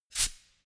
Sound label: pneumatic pump short